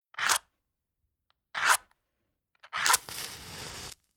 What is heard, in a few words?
Fire Match Strike Fast Two Strikes No Flame Third Strike Match Lights